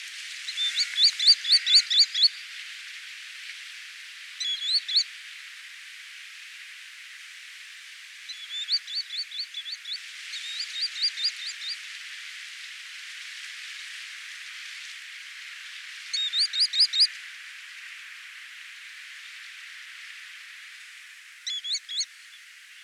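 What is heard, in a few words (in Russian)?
chernysh golos, green sandpiper voice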